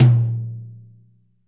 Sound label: wet tom one shot a sharp key; tom drum